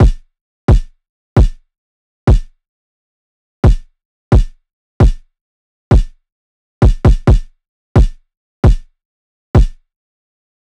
loop kick 132; Seismic Loops

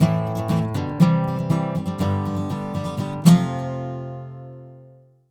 Acoustic Guitar Rhythm, Ukraine Gypsy; Sonic Foundry (Sony Creative Software); Continental Drift Aftershocks

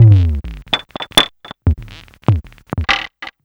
Drums Strange

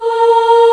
Choir, VOICE; Best Service Gigapack 1

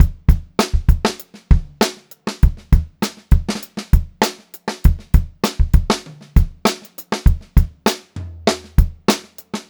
Funk-Groove Drumloops

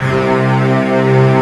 MIDI STR, SYNTH STRING; Greytsounds VOL-1

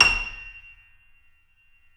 C7 FF, The Ultimate Piano Collection; East West

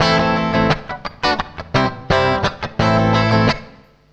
FUNK, FUNKY GTR

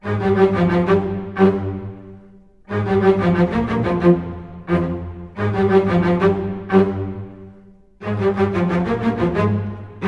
F min theme lo, orchestral arrangements

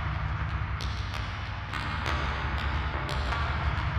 sparse soundscape samples; ReLooped

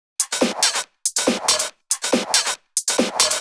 Loops 140 BPM Hihat Percussion Loops

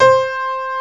BOSENDOR C5; East West - Global Sounds

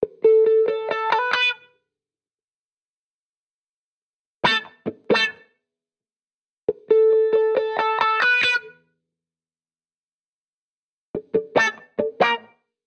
Guitar Bpm135 Key B min, 70s Guitars; Fox Samples - Back to the 70s Guitars

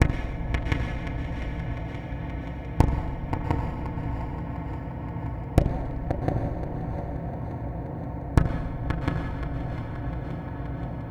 Guit Pluck FX, Ambience; Sonic Foundry (Sony Creative Software); Numina II

